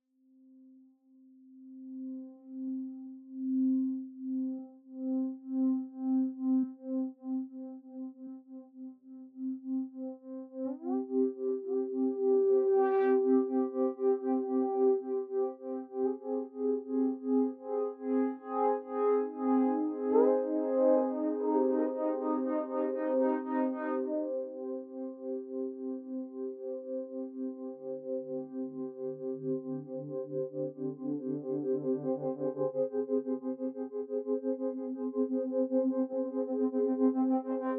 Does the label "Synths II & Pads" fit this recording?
Yes